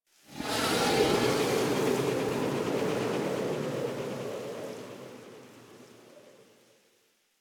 Wind Gust Calm Toxic Throat; Ambience; Misc Outdoor; Warframe; Infested Eris

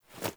Blacksmith Hammer Strike Foley; Characters; Blacksmithing; Friendly; Tuvalkane

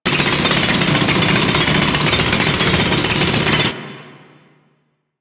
Crp Ship Pillar Square Loop; Ambience; Props; Warframe; Corpus Ship Remaster

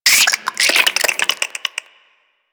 Infested Minion Attack, Vocals; Warframe

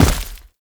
Heavy Step Dirt, Footsteps; Enemies; Foley; Warframe; Tau Dax

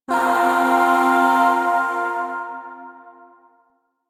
Shawzin Hirajoshi Chord I; Music; Chords; Warframe; Zariman; Void

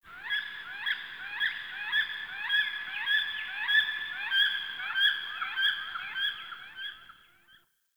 Night Creature Medium, Ambience, Night; Night One Offs

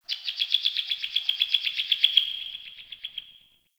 Forest Open Glade Bird Med Two, Day; Ambience

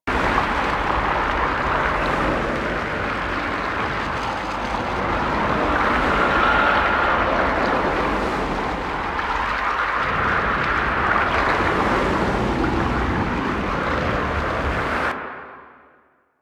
Operator Focus Blast Shield Loop; Warframe; Operators; Powers; Defense

